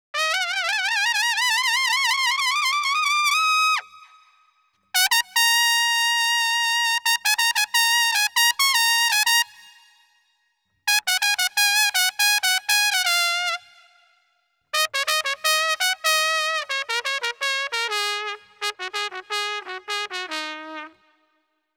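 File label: 125 Jazz Trumpet, Eb minor; Brass; Pro Tools Essential Loops; Digidesign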